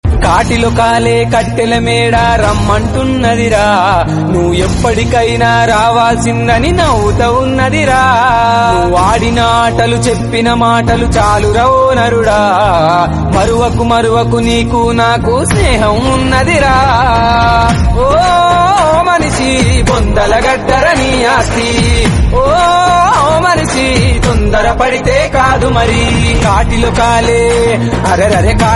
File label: song telugu